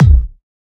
huge bass drum single shot b key

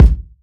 tight low end kick single shot b key

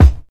dry kick drum single shot f sharp key

